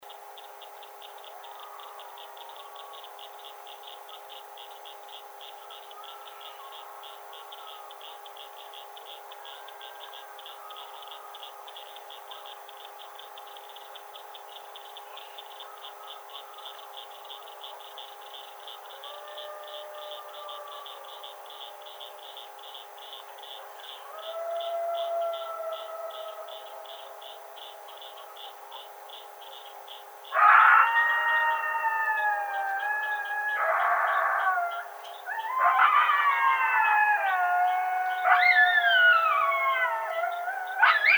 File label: howl, Coyote; Canis latrans; Coyotes (Canis latrans)